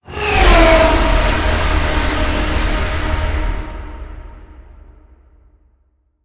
Hit Tonal

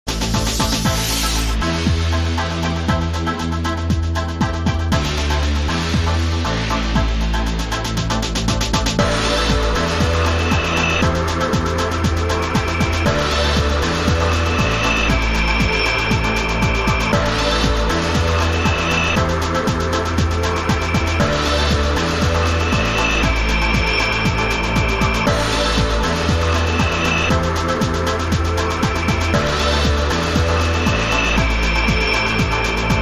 Engine

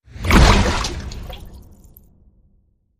Splash Whoosh

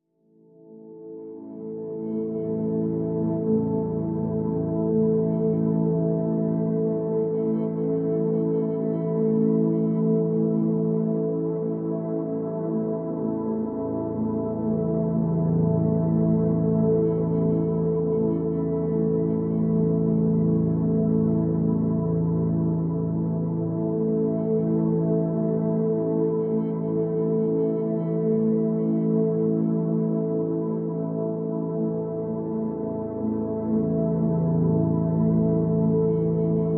Pads (A)

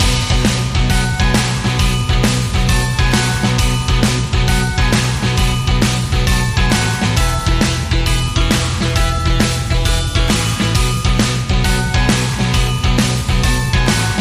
Rock n Roll Christmas Loop Christmas Party

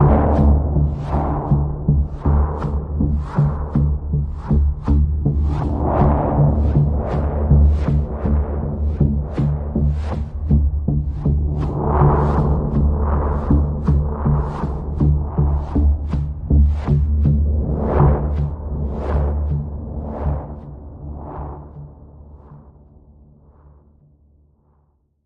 Pulse, Key of D

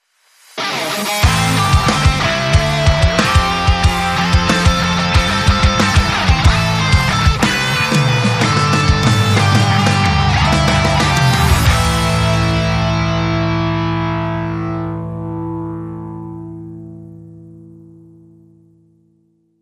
Hard Rock Strut

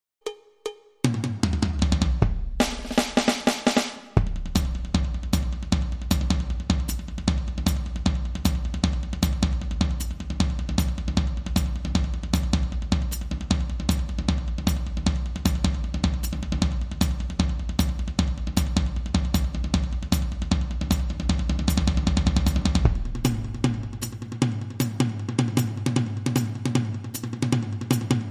Surfer Loop, No Fingersnaps & Hand Claps

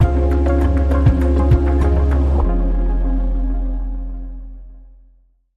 Bumper

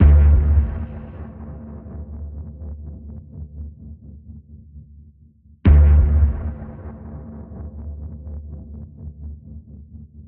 Danger Pulse D, Key of D

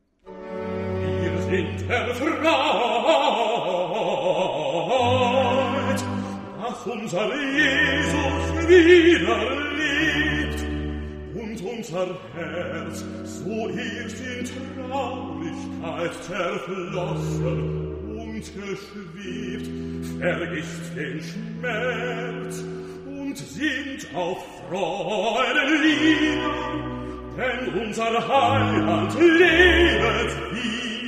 Easter Oratorio Recitativo; Wir sind erfreut; BWV249; Johann Sebastian Bach (PD)

